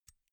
pull out xlr extension latch hard; objekte; elektronik

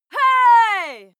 female shout outraged; schreie; menschen

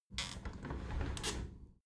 window handle squeak turn close halfway soft; objekte; fenster

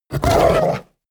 hellhound whimper pain; schreie

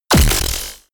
sci-fi plasma cannon fire

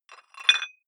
dumbbell thread put on small weight soft; sport freizeit; fitnessstudio

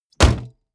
car bmw e46 exterior bonnet close slam

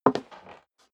step high heels wood creak single right; schritte

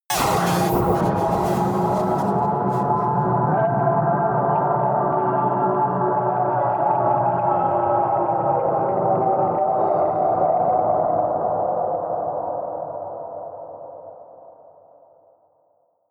stab electronic rise, drones; musikelemente

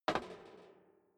step boots metal rattle single stair up; menschen; schritte

